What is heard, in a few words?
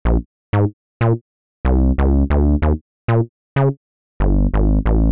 Bass; PatchFactory